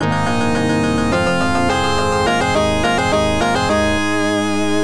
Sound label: Promotion; Sangokushi Retsuden Ransei no Eiyuutachi; genesis